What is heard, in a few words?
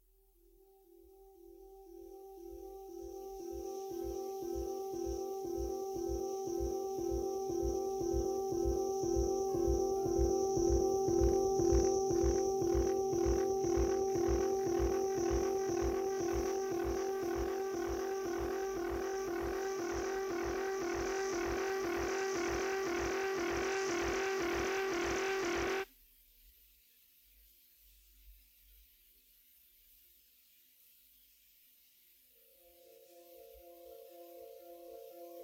instrumental